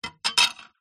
glass sheet small square set down on wood; foley; zapsplat

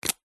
office pen felt tip lid remove

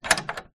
household bolt metal gate movement

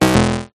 multimedia game incorrect buzz tone

